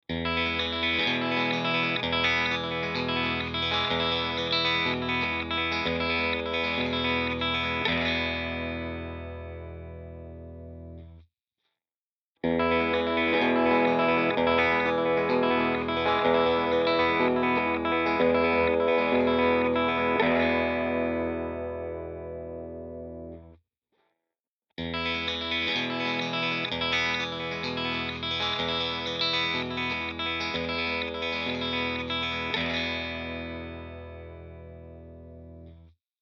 Guitar

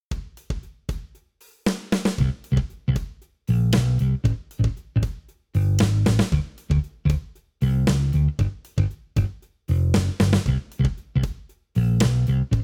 BASS Drum

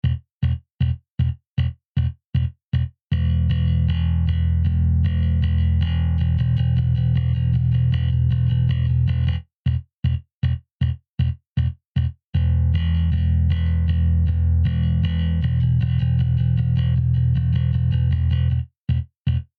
Bass GTR Clean; Cenzo Townshend

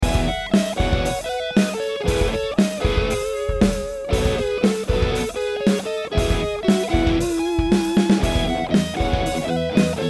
Lead 2x12

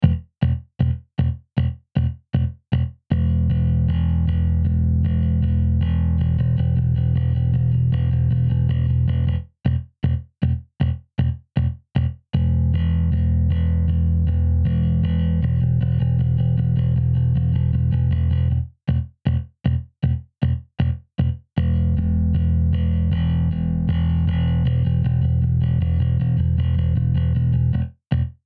Synth Bass; ModoBass OmniChannel

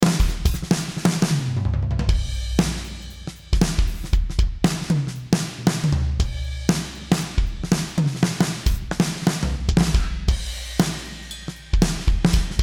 Heavy Rock

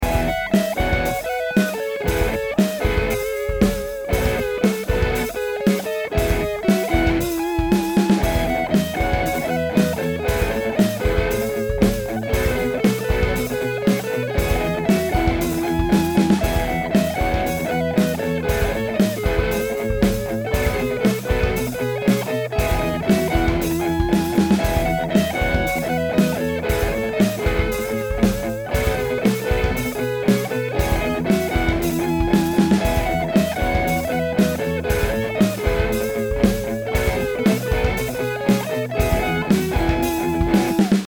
Rockerverb 50 2x12 Combo SM57

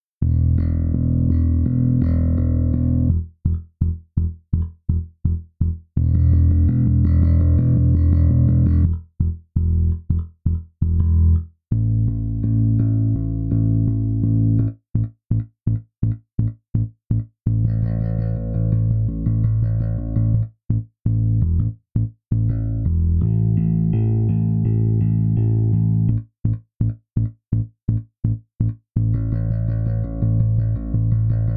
Metal bass default setting finger

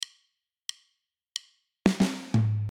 Fill Slow

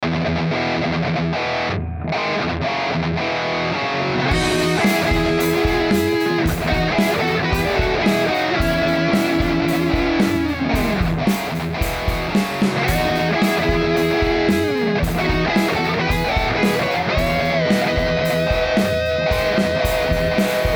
Guitar

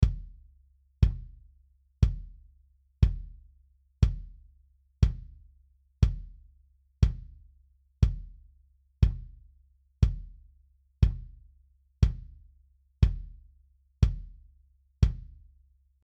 Kick EQ

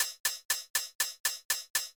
Rhythm HH

